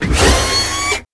gear shift

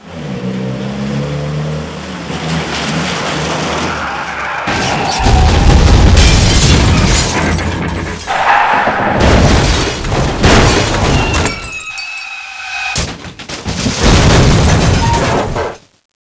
crash sound

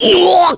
pain; piccolo

